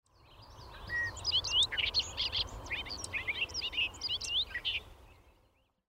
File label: Bobolink